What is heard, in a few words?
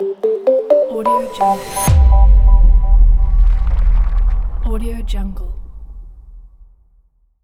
brand logo